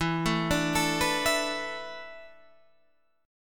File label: A Suspended 2nd Suspended 4th E; Asus2sus4/E; arpeggiated; JGuitar